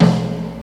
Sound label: small reverb tome drum sample e key, Royality free tom drum one shot tuned to the E note; tom drum samples